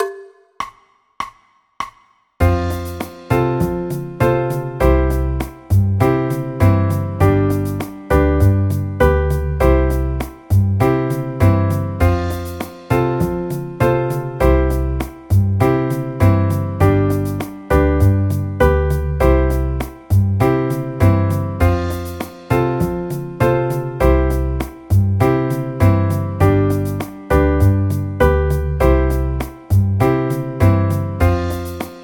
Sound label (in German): c dur akkordverbindungen